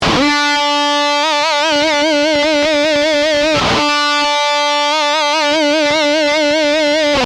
Delayed Vibrato, guitar

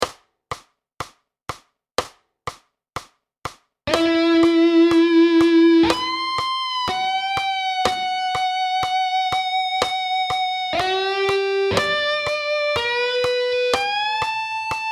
Melody

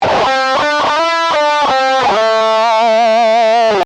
Lick, guitar

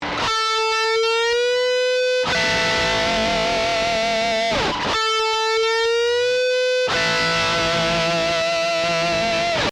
Double Stop

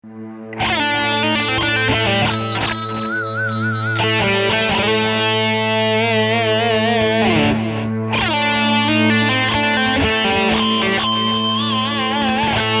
Lick

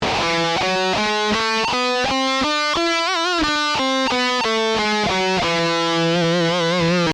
5th Mode Melodic Minor On E

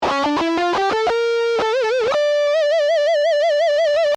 Gtr 1 Harmony

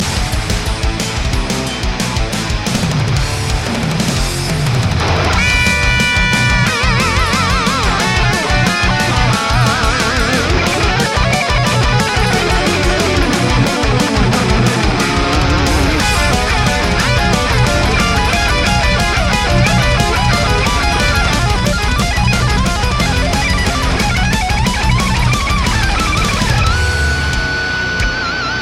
Solo

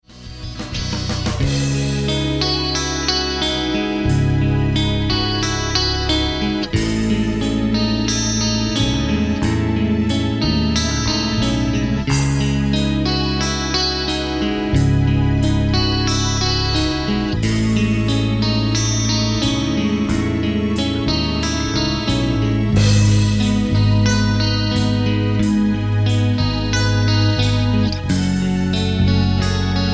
Rock Tracks

